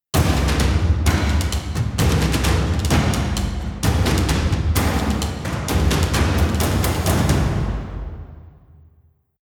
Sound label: Combat In; The Witcher 3 Wild Hunt; Novigrad